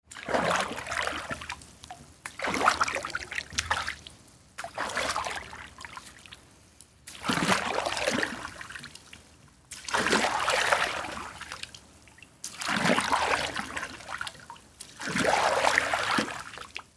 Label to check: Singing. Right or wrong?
Wrong